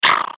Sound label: Gear Multimedia; Web Interactive; Technology; Noise; Electronics